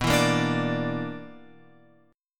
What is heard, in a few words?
B-Minor Major 7th Double Flat 5th; BmM7bb5 Chord {7 7 8 7 X 10} strummed